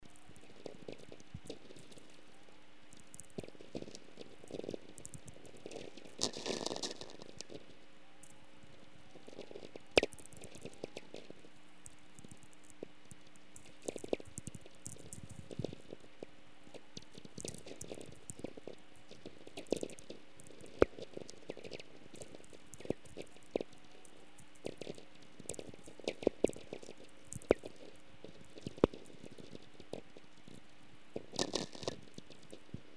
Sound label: vlf risers; spaceweather; inyo; spaceweathersounds